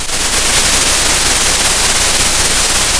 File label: pop, Sound of a thunderstorm